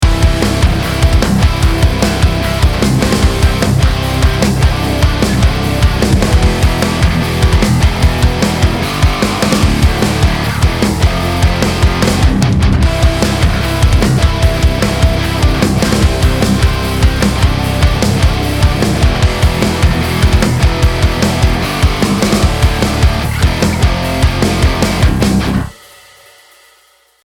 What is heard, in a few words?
Rock